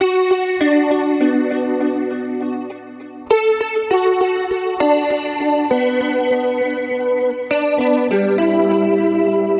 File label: Gmaj Gtr Warped Plucks; Zero-G; Future Wave